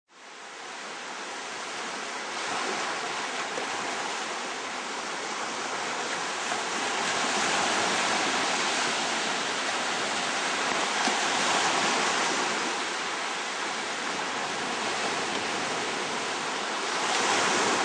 Calm Beach Close To Water, Slow waves lapping up a sandy beac; Recorded very close to the waters edge.